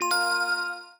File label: Reminder; HP iPAQ Glisten